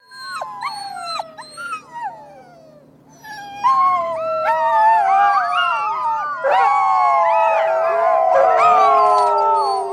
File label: Dog Howl After One Dog